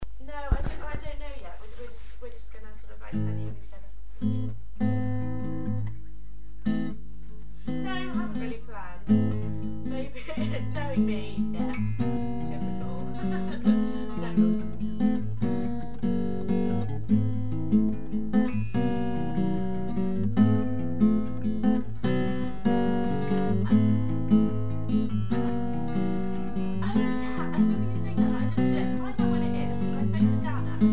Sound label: Am Cmaj7 Fmaj7 C G, Guitar